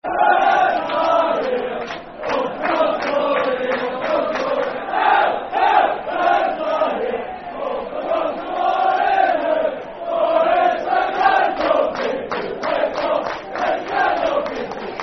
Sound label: Football Chant (Soccer Song), Hibernian; It's A Grand Old Team To Play For; Hibernian Hfc